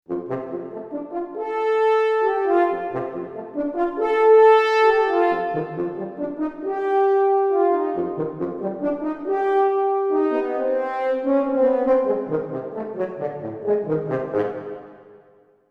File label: Dyn Orch Fr Horn; PC3